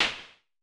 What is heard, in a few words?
SN Low Punch, Vermona Mono15 Drums Snare